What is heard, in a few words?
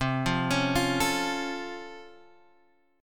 C sharp Augmented Major 7th, C